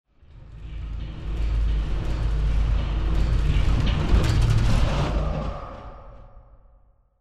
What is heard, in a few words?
High Rise; out my window